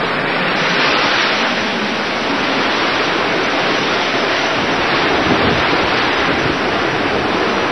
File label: Wind how; 暴力赛车